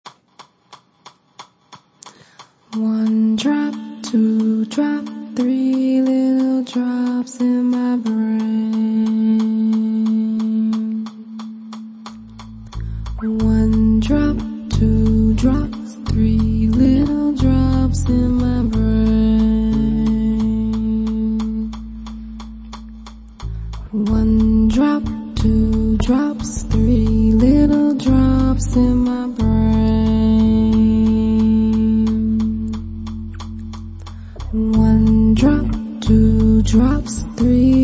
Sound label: 90bpm lofi